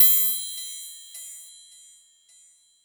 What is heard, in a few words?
hats oneshot, Hat; Pop; Drums; Slate; SD PASADENA Pop Sample Pack